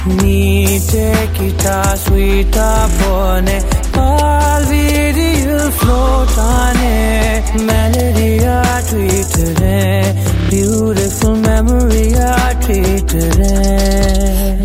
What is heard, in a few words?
Male Ringtone; ringtones; Mersal